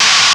SCREETCHY, PHUZZ DRUMS; Spectrasonic Distorted Reality 1

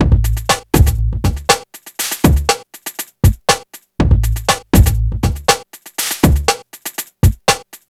Beat, Drums

